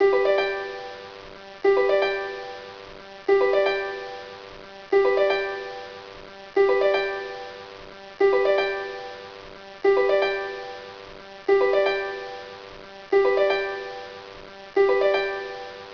harpa Loop

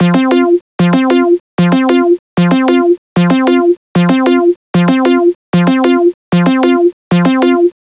sint Loop

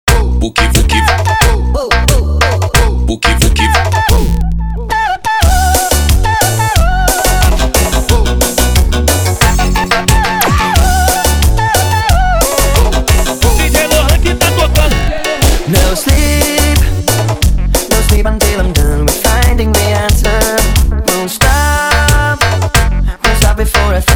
PRESSÃO DO TRIBAL

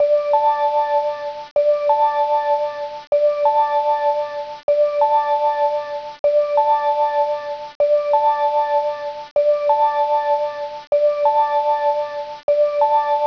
sint Loop

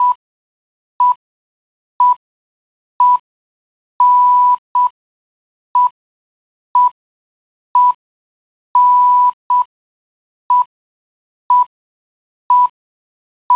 Sinal Horario Loop

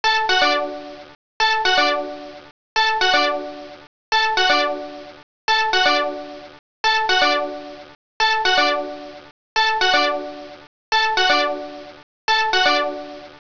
sint Loop

